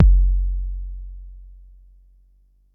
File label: Bassdrum; Jomox Xbase09